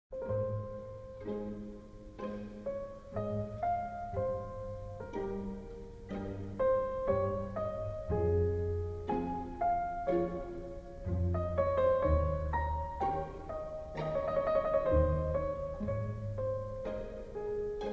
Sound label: Harpsichord Concerto No 5 In F Minor Bwv 1056 Ii Largo